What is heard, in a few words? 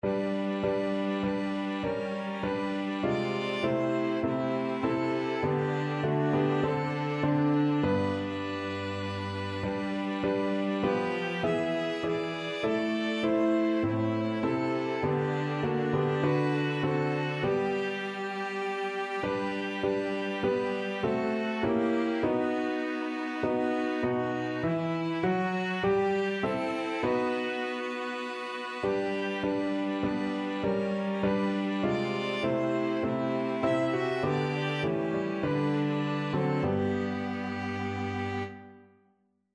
little town, piano quartet